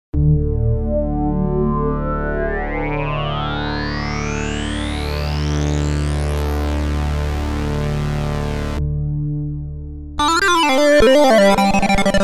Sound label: mixed analog; obx